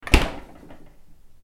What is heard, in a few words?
Refrigerator Door Close